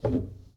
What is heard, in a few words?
Foosball Blocker Rotate